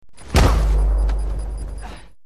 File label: Hit; Battlefield 3